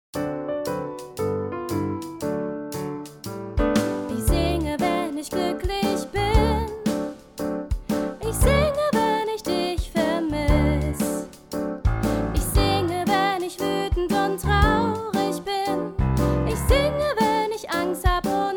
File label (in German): Gesang Strophe Chorus einstimmig